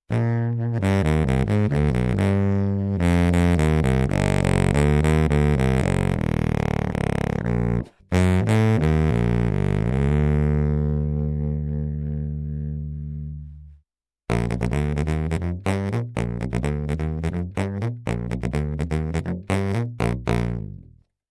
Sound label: pickup tubax; Einbau-Tonabnehmer für Holzblasinstrumente; Sounddemo